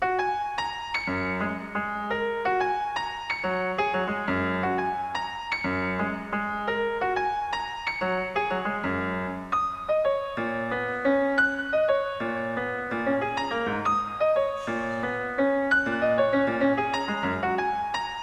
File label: Piano, Smith Hall